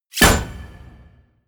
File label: wham, whooshes